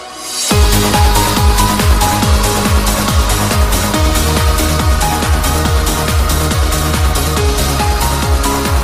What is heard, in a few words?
Trance